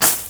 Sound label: plant hit; everness